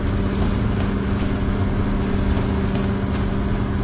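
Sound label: Basement Pump Loop; silent hill homecoming 2008 gamerip pc ps3 x360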